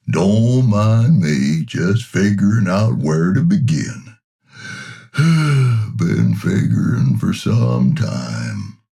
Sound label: Equipment Hauler Dozer Forklift, Construction Vehicle